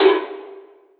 Sweep Oneshot; Sonic Foundry (Sony Creative Software); Esoterik Beatz

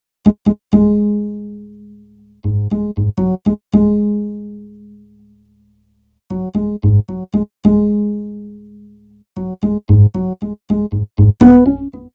Upright Bass, Cinematic Funk; Sonic Foundry (Sony Creative Software); Cinematic Funk Urban Tone Poems

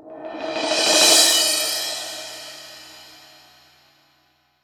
cymbal swell; Voice Crystal - Steve Reid Definitive Percussion

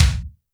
kick, Roland R-70, BD

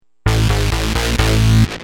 Heavy, Bass; Industrial

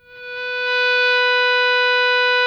GTR, GUITAR FX

